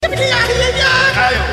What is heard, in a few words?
sing; TF2; Engieneer